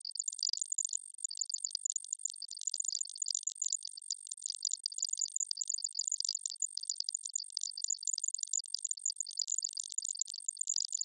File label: Data Processing; Ui data